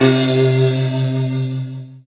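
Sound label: synthesizer, digital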